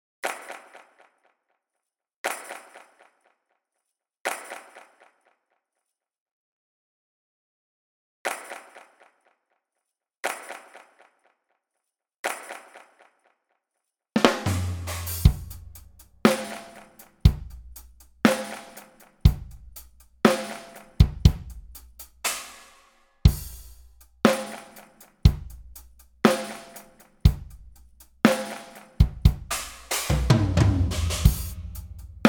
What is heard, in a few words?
Drums